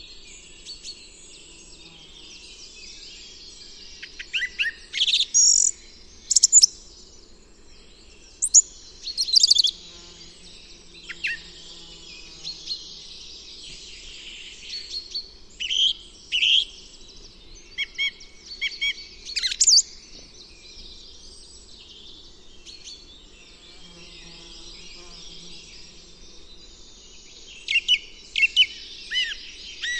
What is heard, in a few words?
turdus philomelos